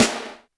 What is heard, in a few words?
snaredrum, Yamaha RM 50